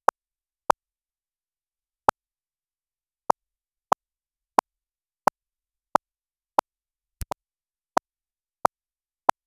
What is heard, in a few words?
create me a poppish click